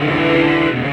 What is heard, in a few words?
Monster Hum Baby, Electric Guitar; Sonic Foundry (Sony Creative Software); Friendly Fire; Wrath